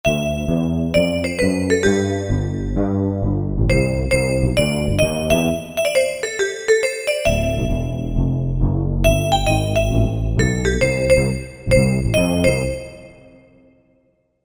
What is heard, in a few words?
Bass Crist, Korg MSC-1S; Korg MSC-1S Variety